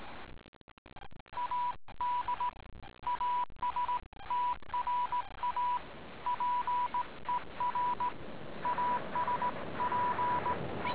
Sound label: morse; Golden Record